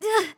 damaged, RPG Voice; RPG Voice Starter Pack

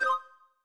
confirm, tones; inteface sfx pack 1

